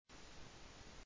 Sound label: silent Audio